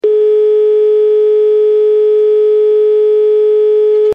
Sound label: telephone dial; soundboard